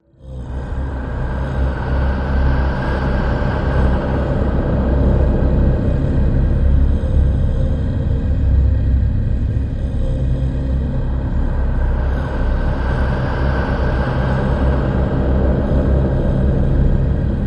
Inhale Exhale Dark Drone

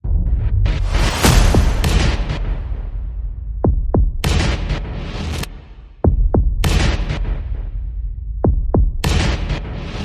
Percussion and Effects

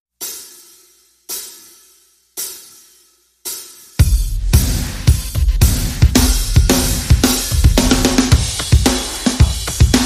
Percussion Only

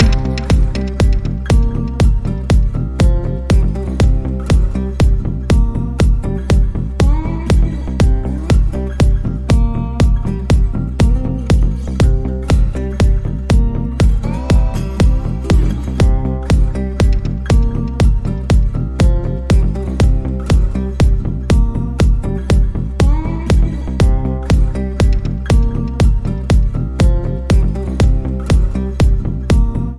Inst Easy Beat

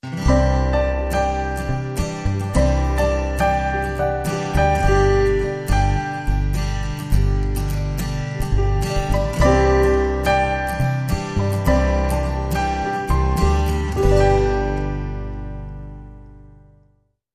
Easy, No Drums

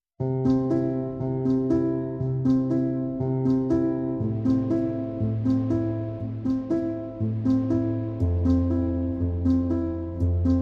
Pianos Only Mix

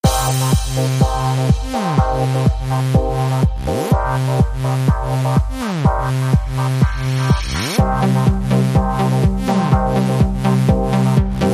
No Synth Melody

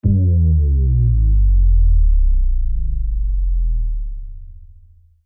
Faint Power Down

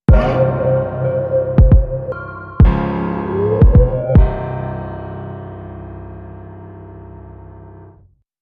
Trap Sting

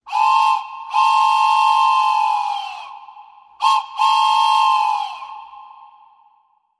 Train Whistle

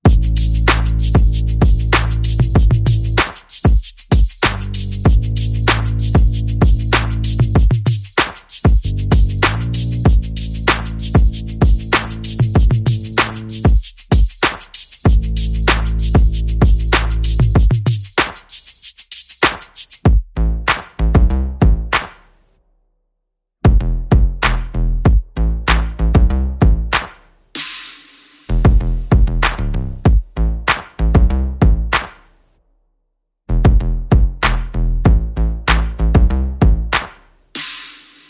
Bass and Perc Only

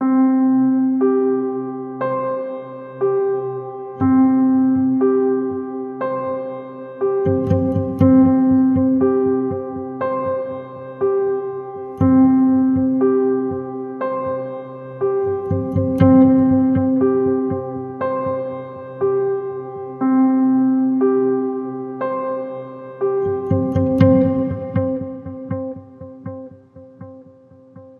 Spark Of Opportunity, No Drums

